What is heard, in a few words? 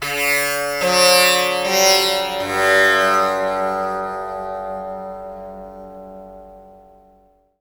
TAMPURA; Spectrasonics; Heart of Asia